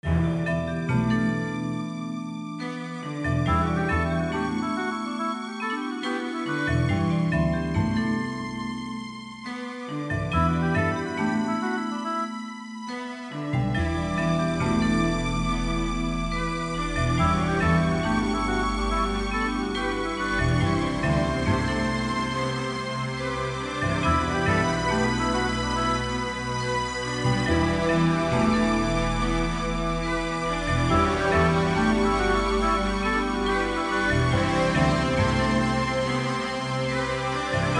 Background Music

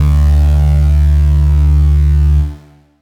Retry Jingle; Friday Night Funkin' Funkin' Origins OST mod gamerip PC 2022

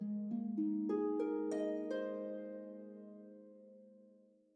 Calm Harp; Super Mario Maker 2

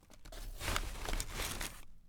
foley pick up; The 39 Steps

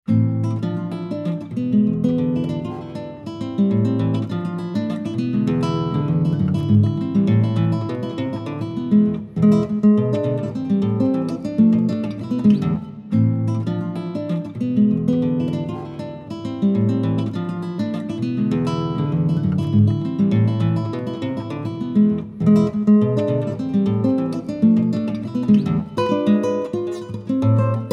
Music Vehicle Radio Events

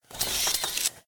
Robot Turning Concrete

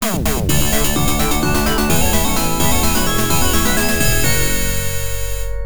Round Clear; Kid no Hore Hore Daisakusen; Terra Cresta; arcade

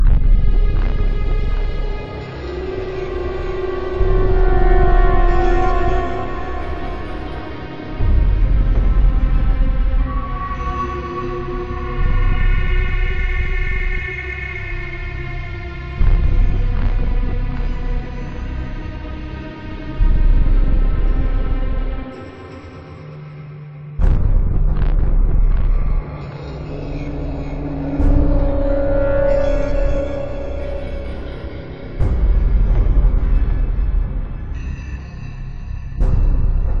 Tension ambient; Judge Dredd: Dredd vs. Death

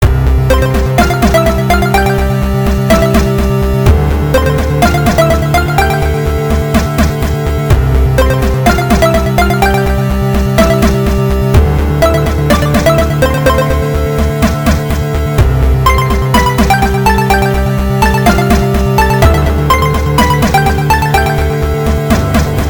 sports music more 8bit; horace ps4 switch windows xbox one gamerip 2019

